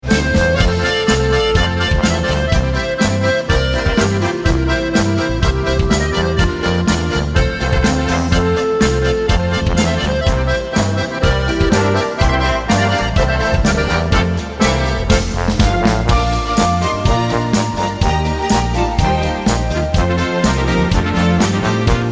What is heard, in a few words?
Zillertaler March